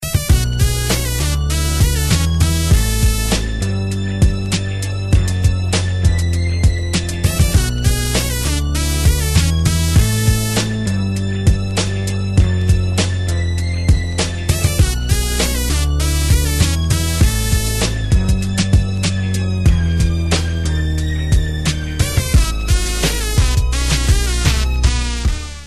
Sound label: Nokia Rnb Tone